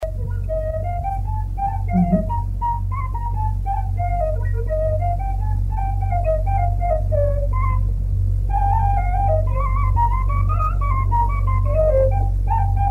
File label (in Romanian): Mazurca la fluier; jocuri si cantece batranesti; Vaslui